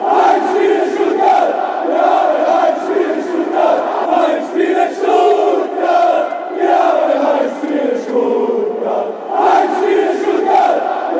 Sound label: home game, fanchants